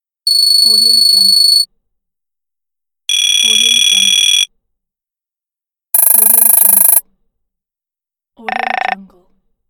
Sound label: mechanized small spinning